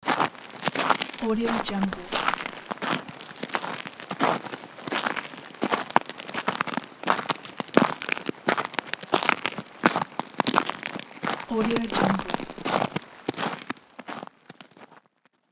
footsteps on icy snow

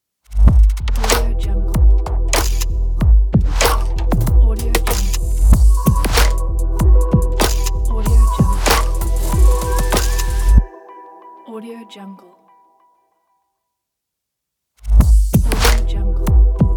fashion logo

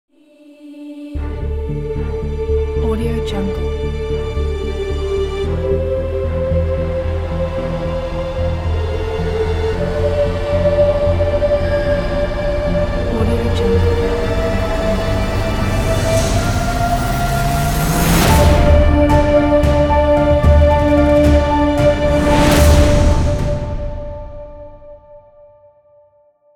short powerful intro trailer

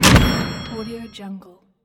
hanging up the phone; yellowcatt

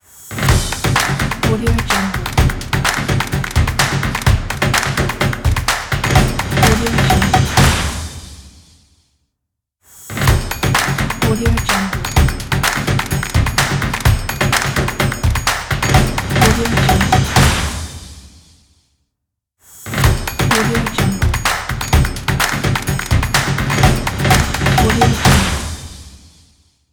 stomp percussion logo

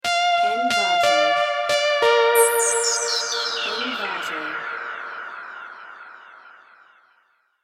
website logo

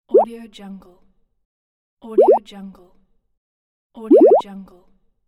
bubble menu selection

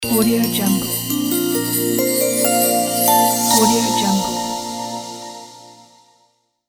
christmas logo

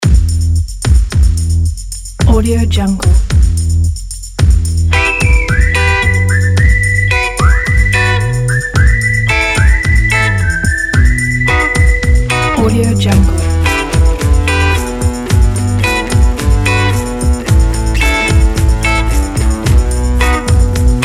upbeat whistle indie rock; bloomaudiomusic

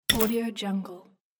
tick

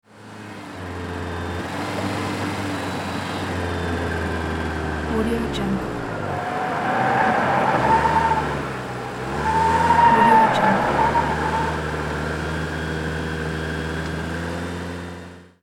car accelerate corners squeal mix

